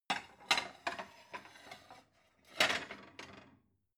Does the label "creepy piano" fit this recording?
No